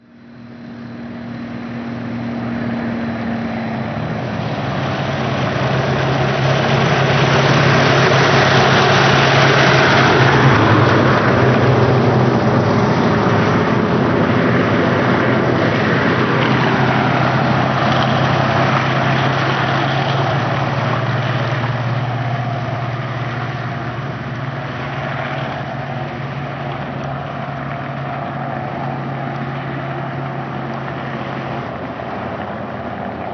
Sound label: Tank